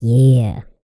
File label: Vocal, R&B & Dance; ILIO; Vocal Planet VOL-4